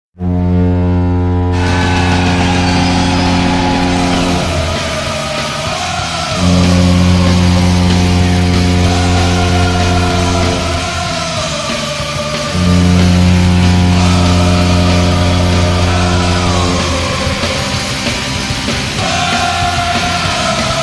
Horn; Anaheim Ducks

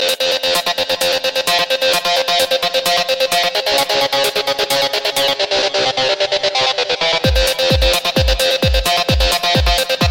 Rave

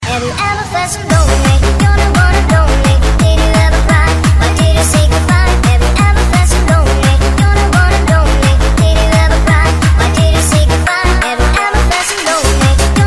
Disco

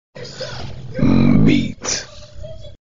Bassy; meat; meloboom

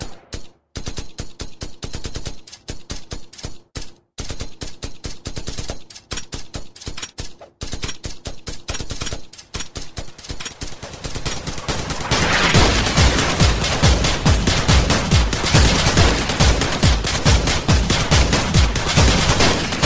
Gunshot Rap

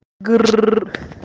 grr

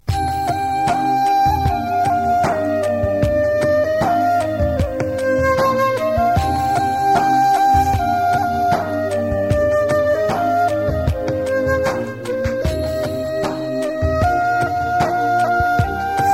Tone

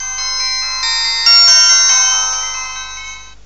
Ring

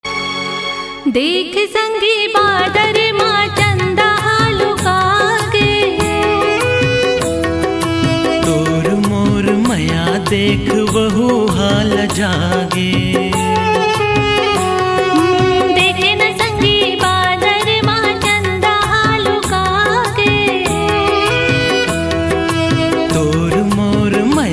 Chatisgari Folk

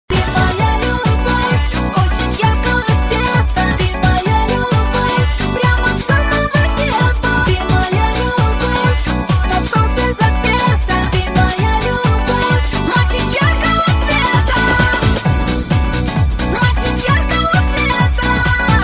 Russian Techno

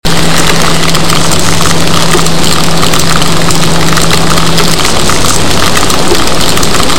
Rice Crispy Pop Loud

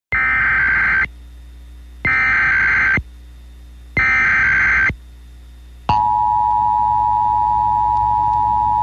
Emergency Test

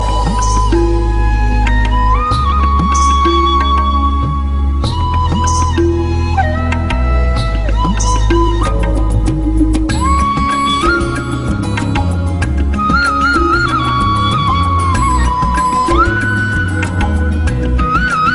flute Tone

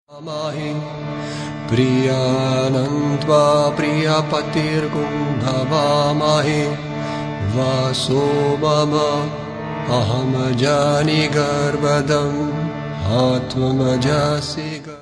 Ganesh Mantra

